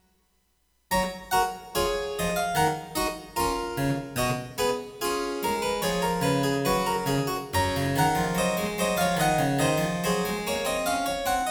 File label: Bach French Suite 5 Gavotte